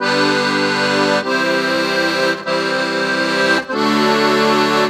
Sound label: Accord 098bpm G, Accordian; Quazibeat - African Secret